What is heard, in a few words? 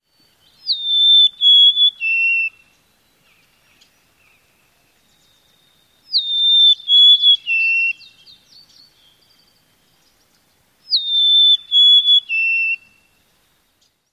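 Golden-crowned Sparrow songs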